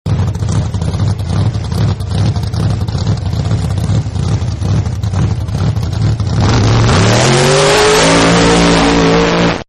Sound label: Hot Rod Idles and Takes Off, car; Hot Rod 1 Idles And Takes Off; Cars; Vehicles; Partners In Rhyme